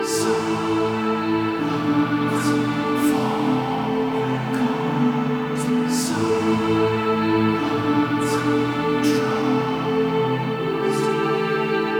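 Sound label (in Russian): hor zvuk, choir sound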